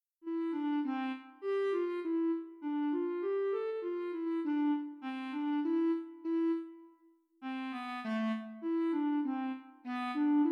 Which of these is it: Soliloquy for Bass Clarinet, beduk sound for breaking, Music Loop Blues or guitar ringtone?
Soliloquy for Bass Clarinet